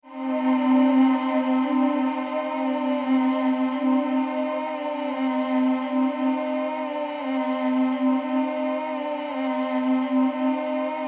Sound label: Drone Mystical Female Vocal Pulsing Loop, Drone Mystical Female Vocal Loop Pulsing Chant; Musical Sound Design